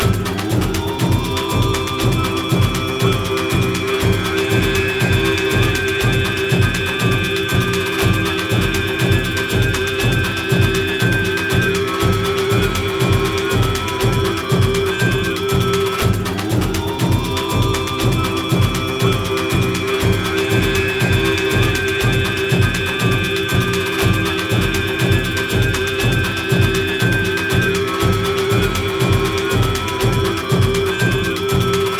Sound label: battle layer